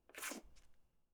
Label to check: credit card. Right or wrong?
Right